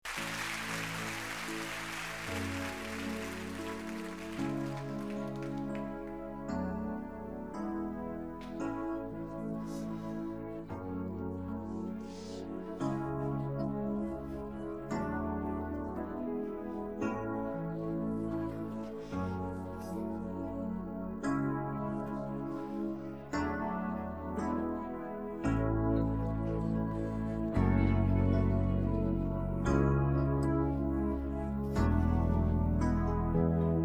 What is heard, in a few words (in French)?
instrumental voix; chorale